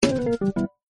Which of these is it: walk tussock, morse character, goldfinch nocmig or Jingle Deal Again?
Jingle Deal Again